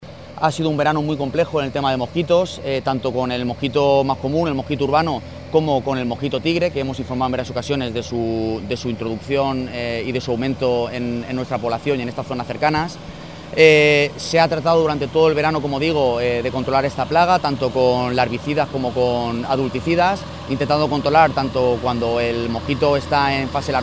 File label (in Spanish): fumigacion mosquito